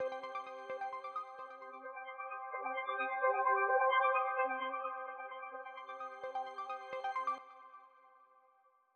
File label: Spectral Granular